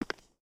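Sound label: Foot Concrete Sneaker Walk; footsteps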